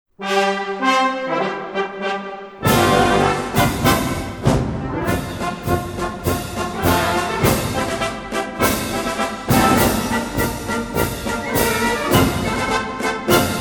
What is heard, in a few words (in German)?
Marsch